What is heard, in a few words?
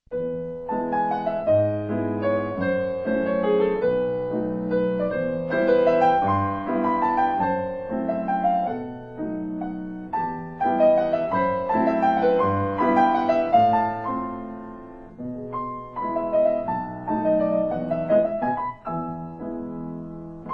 graceful waltz